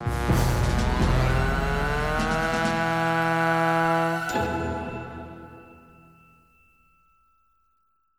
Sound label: Clean Up; Disney Infinity